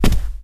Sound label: Rug Run; moul